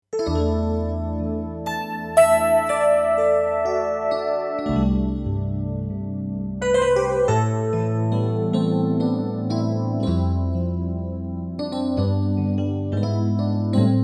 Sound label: Kurzweil PC 3; kurzweil PC3: El. Piano...FM n° 3; Demo piano elettrico FM con kurzweil PC 3.